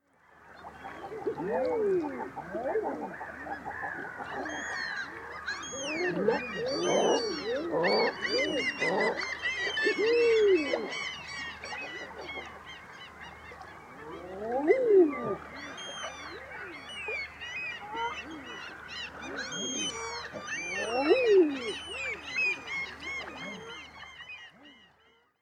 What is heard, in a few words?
Eider Somateria mollissima